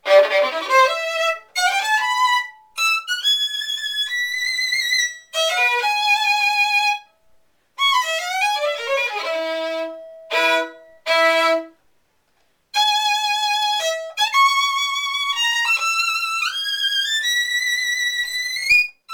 Violin

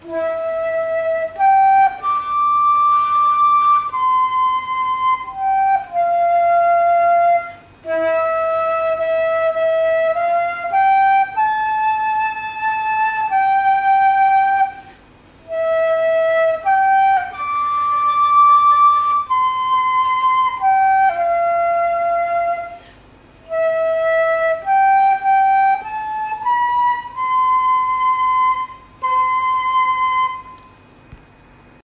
Flute

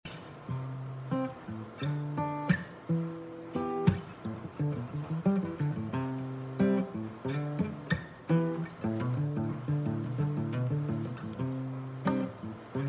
Guitar

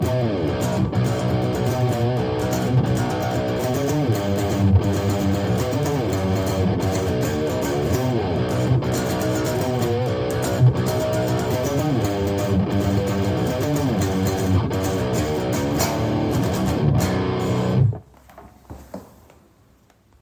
Guitar

